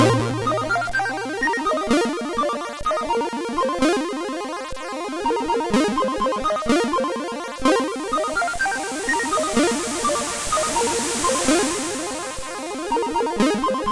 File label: Shogunnie, sid CSG8580R5